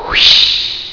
Whish; Concentroids From Planet X

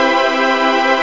orch, maj7

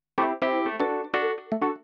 ORGAN HOUSE 125 A; eJay; Keys

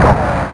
Turret; Big bang

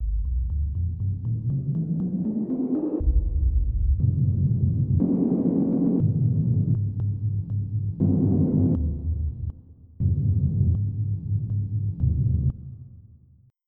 Bunker Wind; croc; AMBI LAVA

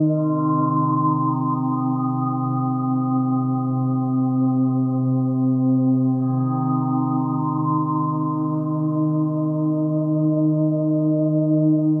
SPHERE D+B 160; eJay

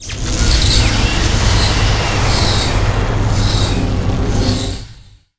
explosive tune; Earth and Beyond; EA Games

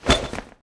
fire throw

